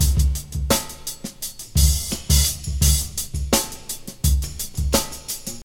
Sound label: 85 bpm drum beat c sharp key, kick tuned to the C# note